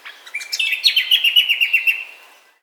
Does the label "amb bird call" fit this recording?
Yes